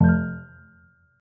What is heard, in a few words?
button Click; netent; copycats